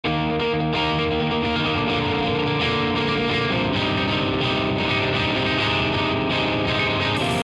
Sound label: happy elektro intro